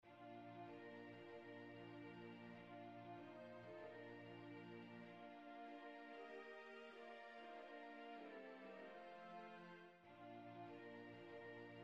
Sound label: Bridal Chorus; Wagner